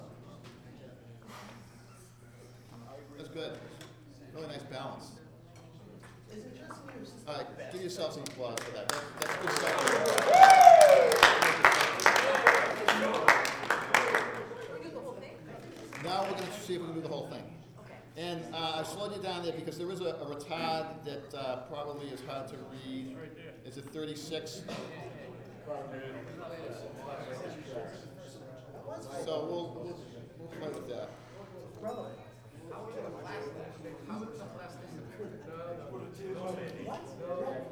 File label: Applause; ensemble practice